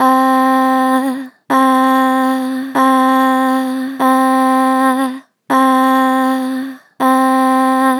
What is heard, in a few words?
Ahh, Natural Vibrato Gentle, Harmor Vocals